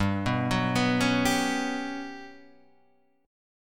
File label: G Major 7th Flat 5th, Guitar, DADGAD; 5,2,4,4,4,5; down